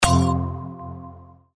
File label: glass fall